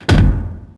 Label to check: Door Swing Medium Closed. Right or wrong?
Right